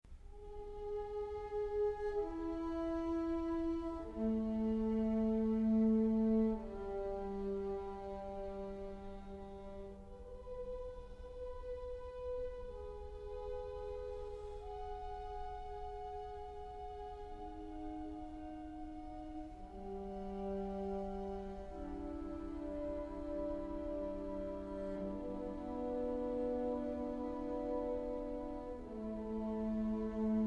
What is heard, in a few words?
Quartet